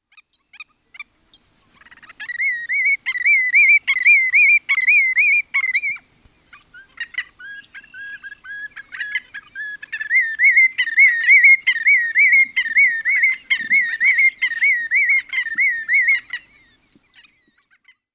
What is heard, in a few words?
Tringa semipalmata, Water Birds; Chordata